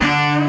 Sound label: orchestra string hit D